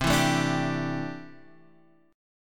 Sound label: C 6th Add 9th C down Guitar Half Step Down